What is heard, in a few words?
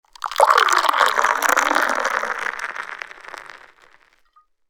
Pour beer, Bar; el colmillo de cobre